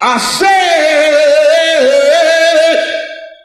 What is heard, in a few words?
i said, sung